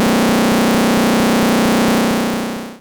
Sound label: wormhole; The Adventures Of ASTROMAN; Ludum Dare 19